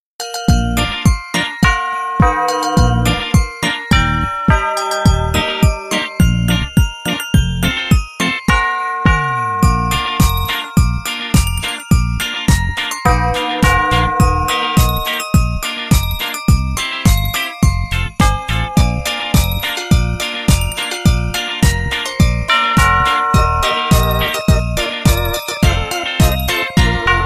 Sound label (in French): cloche pere noel; sonnerie